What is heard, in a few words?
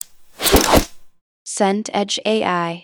fabric swish